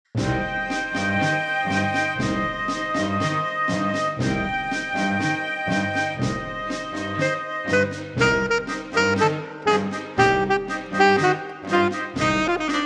ALTO SAX

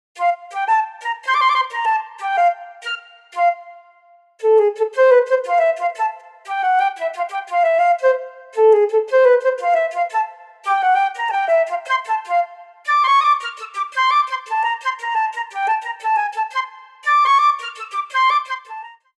FLUTE

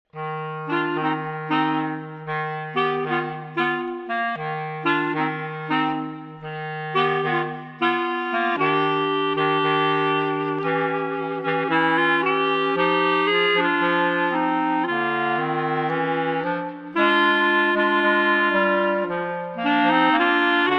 Clarinet Trio

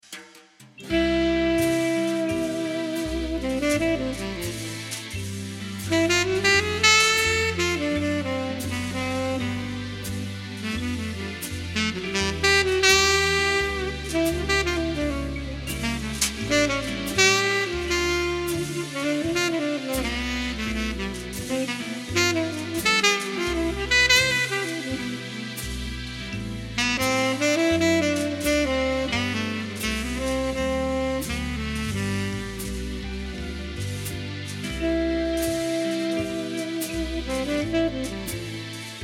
Swing Tenor

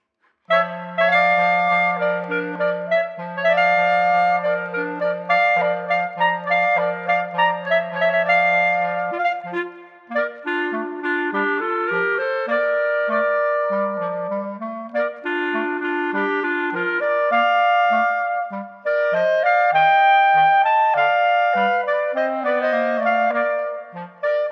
Clarinet Trio

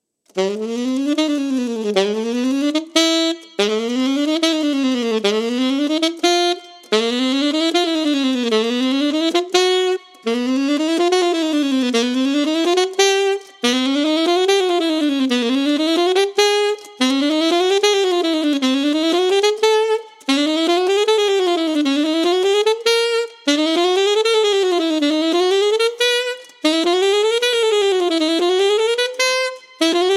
Alto Sax

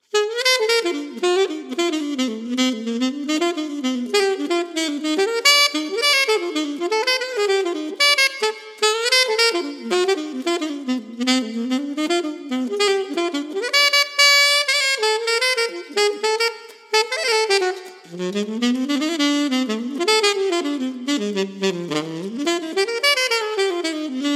solo jazz saxophone; 3 pieces for solo jazz saxophone

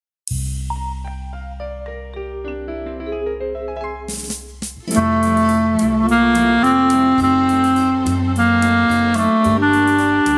CLARINET

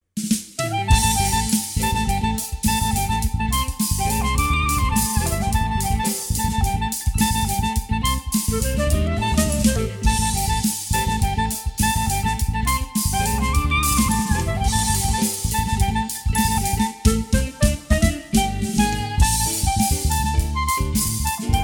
Clarinet

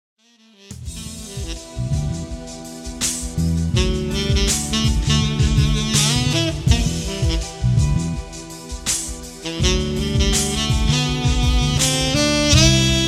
Tenor

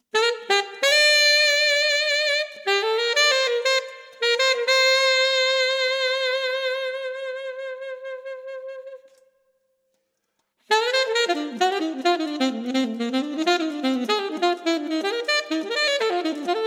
solo jazz saxophone; advanced-level; 3 Pieces for Solo Jazz Saxophone

